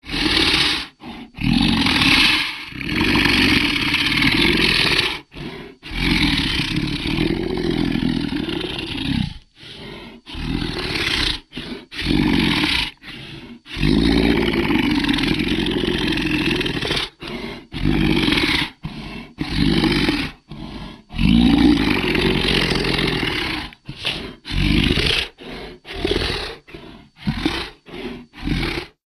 Monster Long Growls

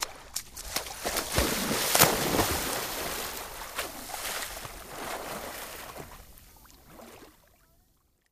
Person Run Diving, swimming

